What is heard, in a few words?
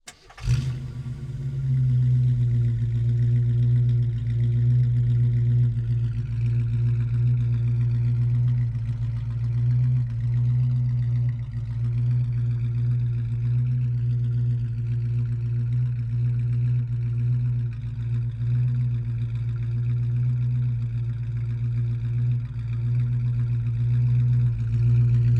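amc javelin exterior start idle blips off; 1968; Pole Position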